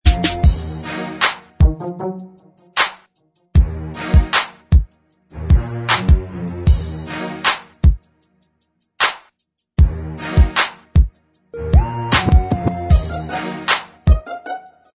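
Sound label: Instrumental Track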